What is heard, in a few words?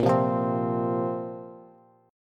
Bb Suspended 2nd Bb down Bass; strummed